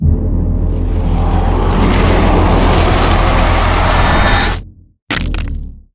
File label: Boss Rush Victory